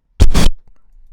Digital Error

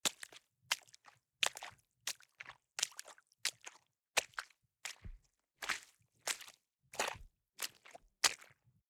Footstes Water

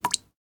Water Drop One Shot Dry

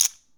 Poker Chips

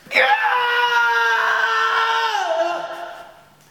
Blood Curdling Scream Male

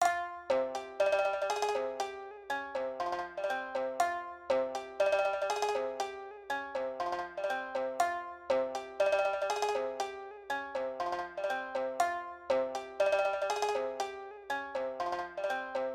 Koto and shamisen loop

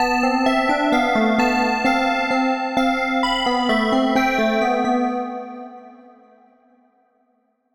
Magic Castle Crystal Synth Loop

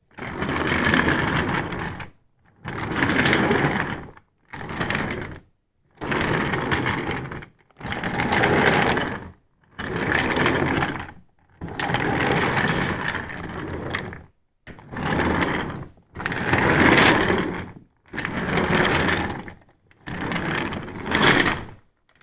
Rollin Office Chair

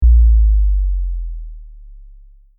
Sub A Sharp; Oceano

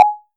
Retro Inspect Sound Ui Or In Game Notification

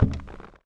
Chair

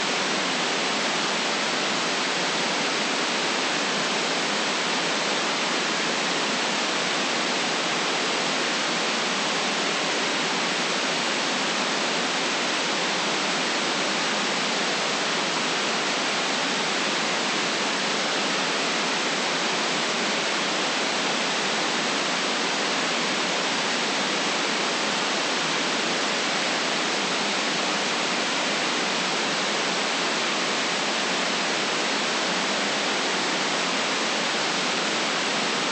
Soft Static Noise

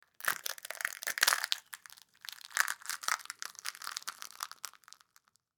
Bone Crunch Dry; Gorebone; Kvv Audio

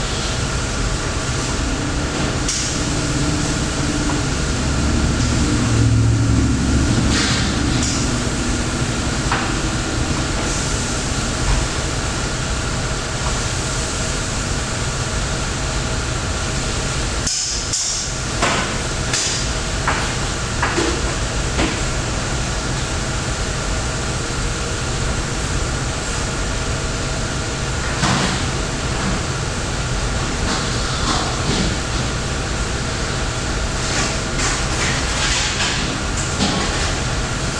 Restaurant Kitchen

